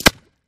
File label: Bullet Impacts Water